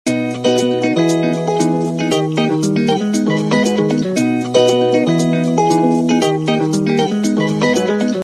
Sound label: smooth sub